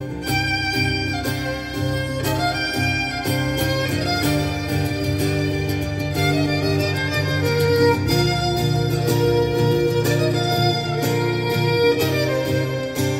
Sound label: Hollywood movie theme violin ringtone